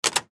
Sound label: Hospital Door Handle, Hospital Door Handle Movement Fast; Hospital Sound Effects; Emergency